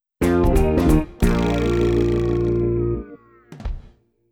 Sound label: Rocket Belt Failure Jingle